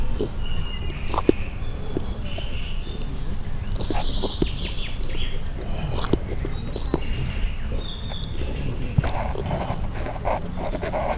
tapír almában csámcsog; szegedi zoo 2011

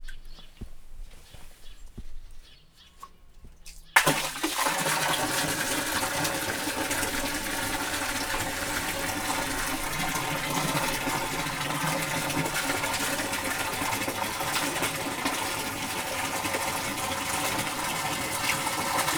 vodorbol viz kutba visszaontese; varboc

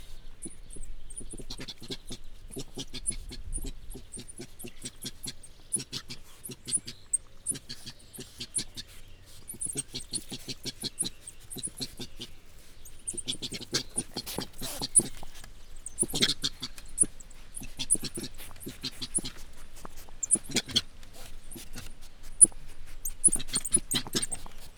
aggodalmaskodó hang területükre merészkedtünk, ormányos medve; jaszberenyi zoo 2015

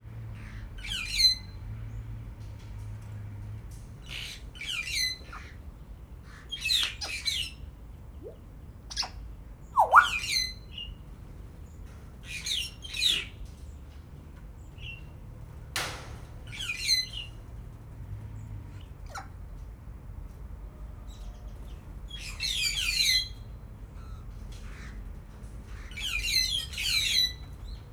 kétszer jákó, jákó papagáj; szegedi zoo 2011

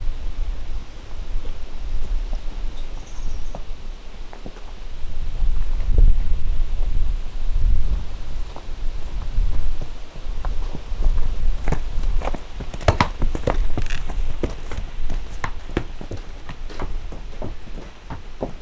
istallohoz vezetik erosszel, lo; állathangok; paraszt udvar

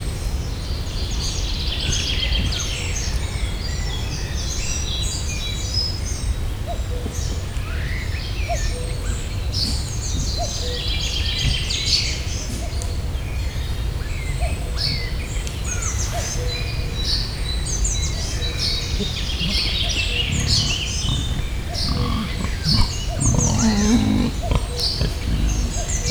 kakukk majd vaddisznó; miskolci zoo 2018

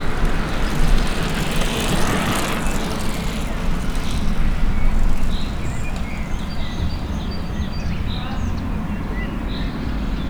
balrol egyedul, kerekpar varosi; visegrad

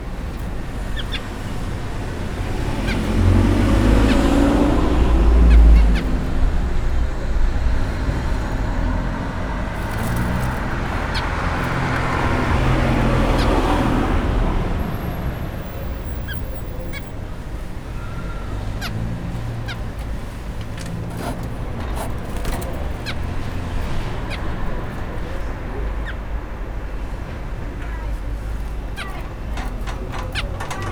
bolt előtt kalitkában madár járműforgalom

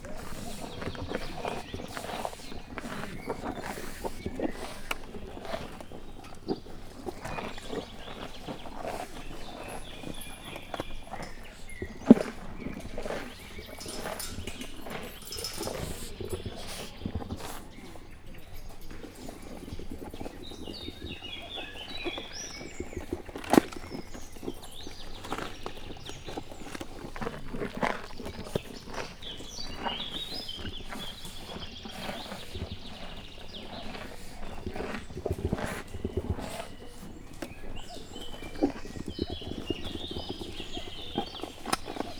zöldséget lakmároznak, egypúpú teve; állathangok; miskolci zoo 2018

